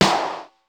lo pop sht; sr16 sd

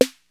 snaredrum, tr808

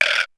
frogfish; sr16 perc

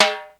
timb h; xr10

